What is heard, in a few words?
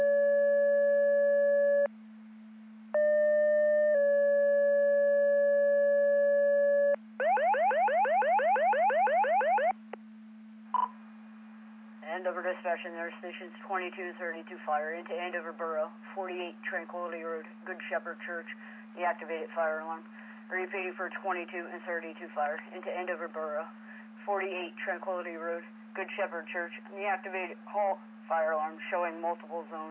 Siren; Andover Twp Boro